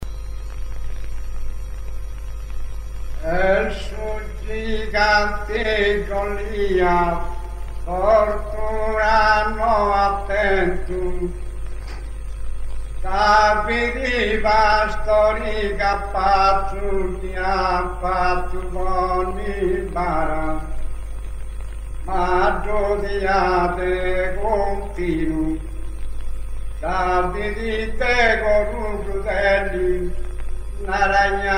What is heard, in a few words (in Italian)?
mutettu, canti monodici non accompagnati; zeddiani